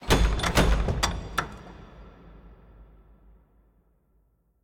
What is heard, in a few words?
bang